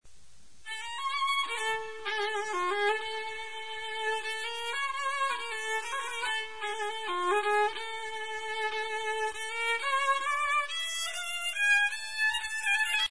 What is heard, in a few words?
kamancha, Armenian musical instruments